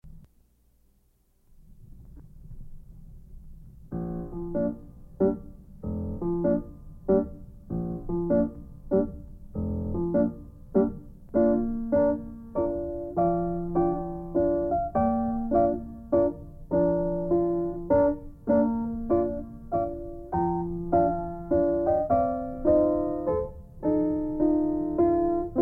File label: piano instrumental